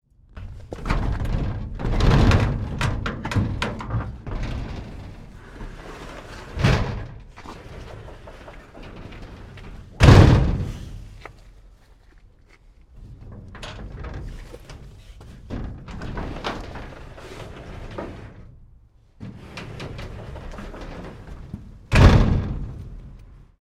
Garage Door Open and Close - Up and over metal garage door; Garage Doors; Tom Hutchings